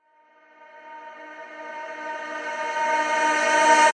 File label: reverse vocal pad